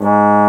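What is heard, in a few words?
Bass Trombone mf G#1; Digital Sound Factory Virtuoso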